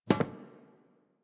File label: NPC Step Default, Footsteps